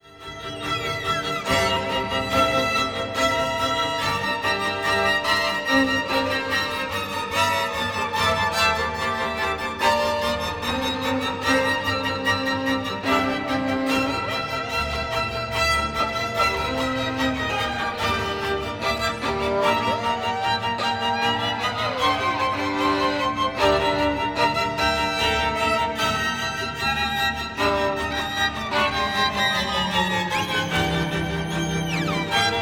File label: Violin Concerto; Violin Concerto No. 2; 1. Dance Ascent